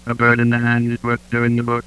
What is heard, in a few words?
Bird (F1 F2 F3 &c)